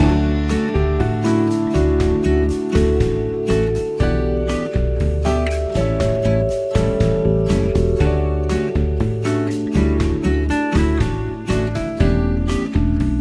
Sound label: Inventory Loop; Summer Catchers